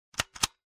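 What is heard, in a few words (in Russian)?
shtamp, stamp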